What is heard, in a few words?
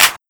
Clap; Pyrex; pitchglitch